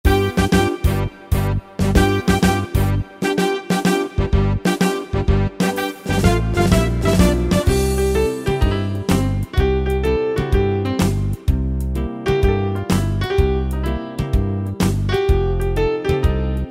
Hully Gully, Yamaha PSR-3000; Factory Styles

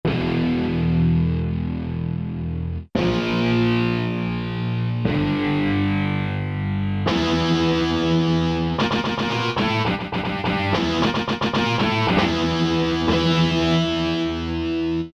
GTR Power Chords; Roland L-CDX-01 Rhythm Section Instruments

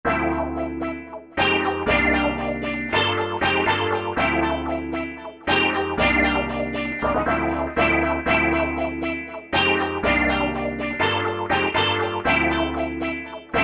NYC Club, House Collection; Roland SR-JV80-19 House Collection

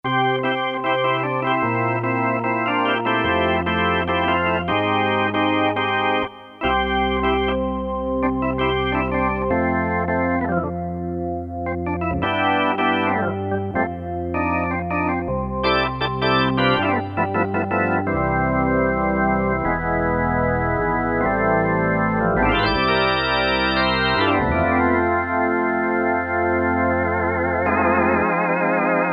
Organ, DWGS synthesizer presets; Korg DSS-1; Korg DSS-1 Sound Library

